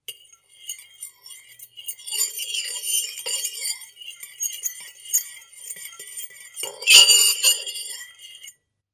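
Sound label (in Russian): звук вилки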